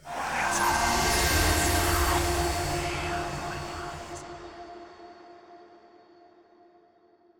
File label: mystery Stack Symbol Animation Start; netent